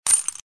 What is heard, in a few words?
tip sound